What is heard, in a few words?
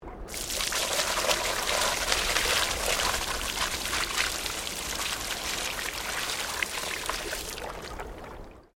Water Pour Concrete Small; Industrial Water movement; Nature